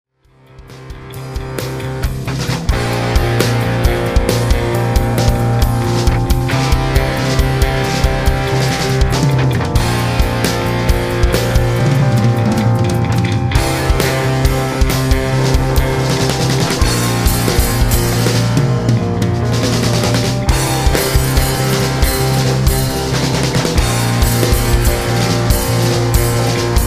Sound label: Drum Solo JazzRock 70s